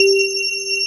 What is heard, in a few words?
SYN G5; Best Service Gigapack 1